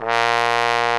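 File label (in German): POSAUNE VIBRATO; Best Service; Alpine Volksmusik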